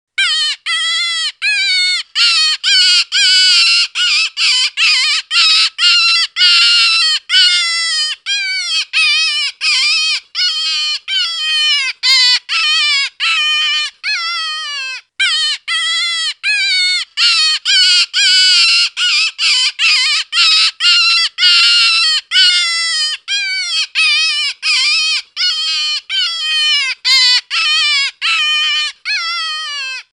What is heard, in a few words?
Cottontail Distress Young; Rabbit